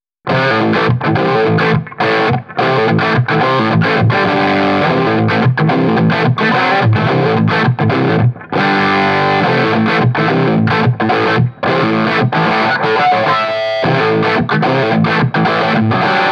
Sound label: Rattler high gain; jam pedals